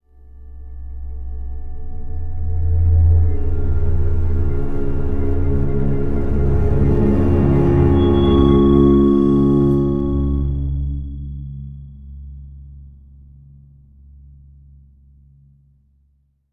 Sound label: sting Death; the walking dead michonne 2 give no shelter